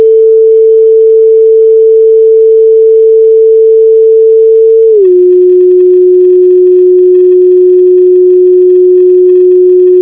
Doppler short dist slow

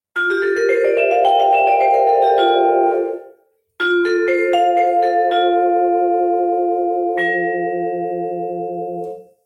F scale on vibraphone

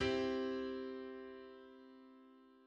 Major triad on C

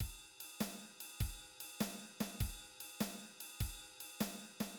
Shuffle feel

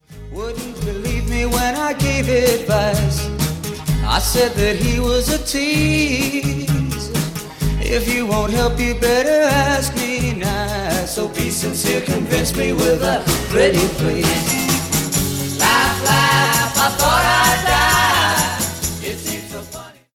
Laugh Laugh

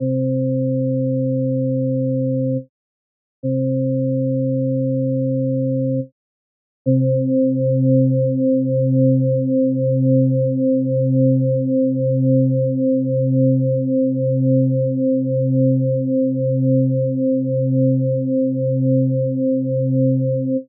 Sines 12 cent difference

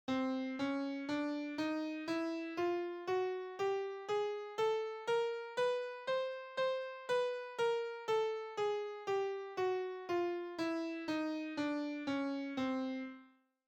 Chromatic Scale Up Down

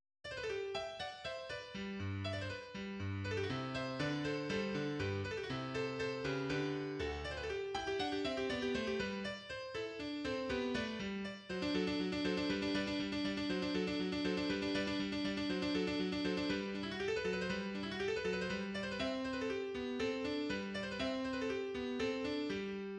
Coda Sonata in G Major; Haydn's

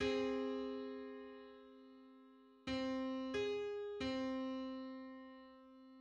Undecimal minor sixth on C

